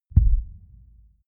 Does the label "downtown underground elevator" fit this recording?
No